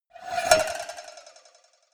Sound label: cowbell scratch hit move hard